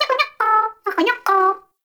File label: baby vocal singing; Nokko; Warframe; Nokko Colony; Find Nokkos